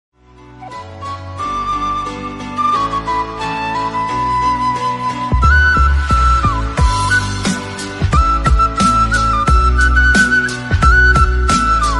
Flute Ringtone instrumental Ringtone

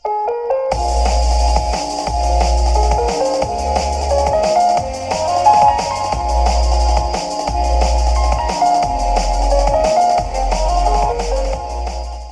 Abstract Ringtone

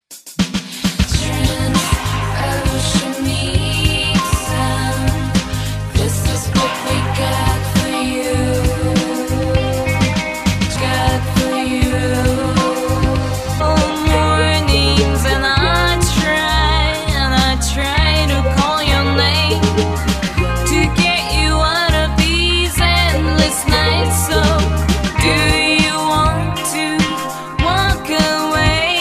LG V15 5G Ringtone

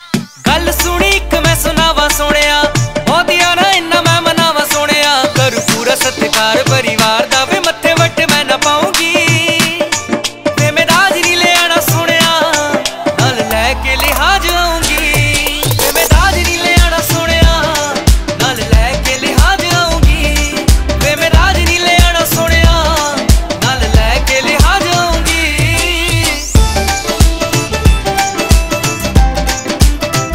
Punjabi Ringtone

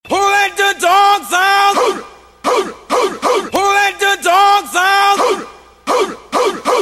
Song Ringtone

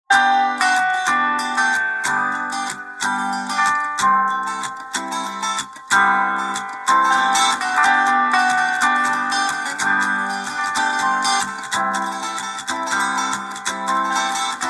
Huawei Nova Y60 Ringtone

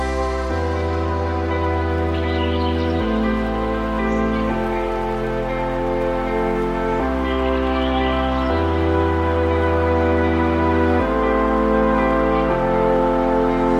Huawei Melody

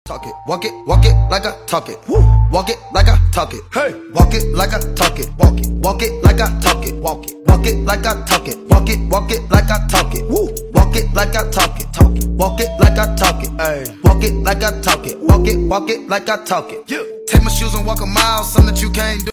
English Ringtone

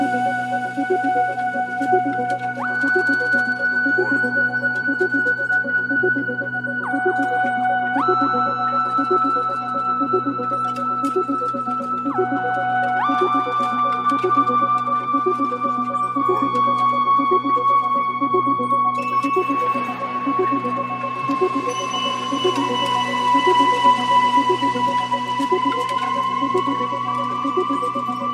Samsung Ringtone

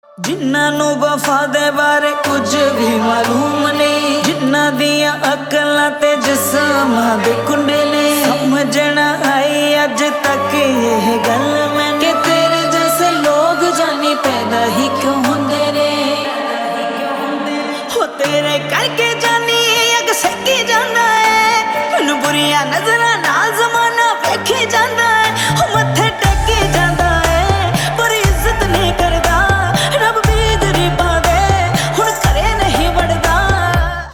Punjabi Song Ringtone

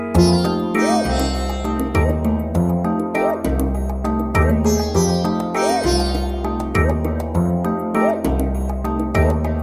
Nice Strings Ringtone; Oppo A31

